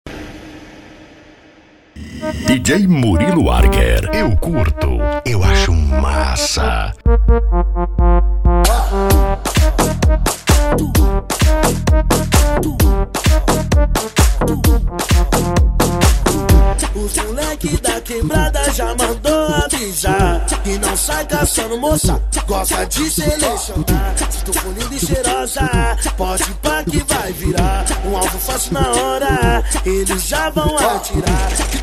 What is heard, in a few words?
funk